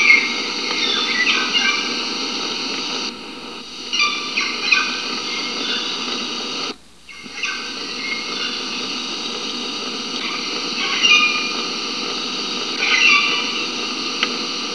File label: imperial, parrot